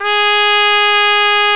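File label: BRA TRUMPE, trumpet, brass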